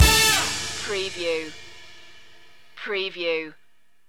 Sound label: spy lounge loop ending